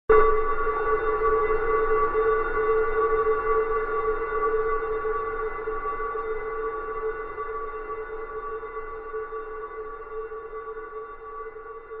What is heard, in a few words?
horror hit bell like clunk high pitched eerie desolate cold; zapsplat